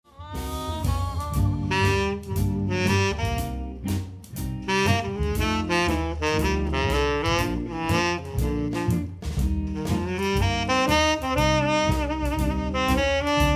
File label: sax, jazz